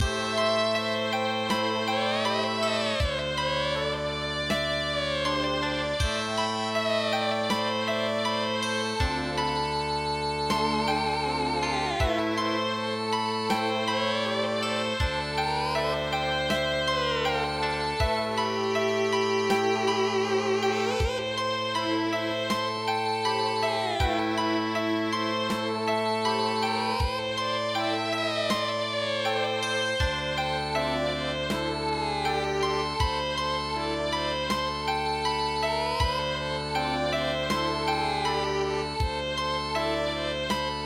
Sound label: Longchuan diao Erhu Music